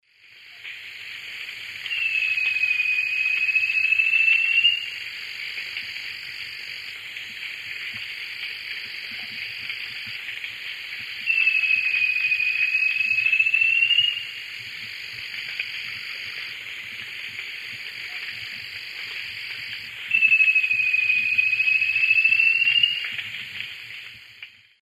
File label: Formicarius colma, song; S. America; Eastern Lowlands